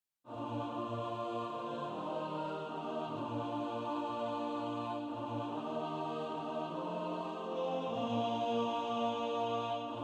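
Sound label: alto; One sweet solemn thought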